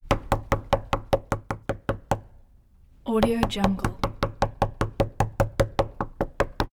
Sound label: door knock; prosoundfx